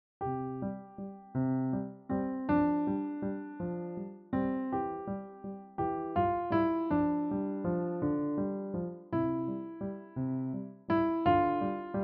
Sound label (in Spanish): Valses